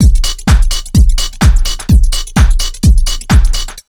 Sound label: 127 BEAT 4, Fresh Disco House; USB Soundscan vol.20; AKAI; 90s sample CD